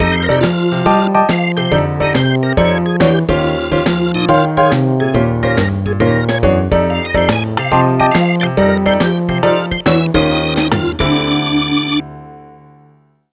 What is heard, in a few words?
Jazzy